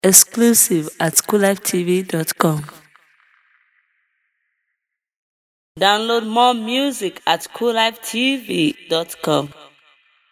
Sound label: Instrumental Beats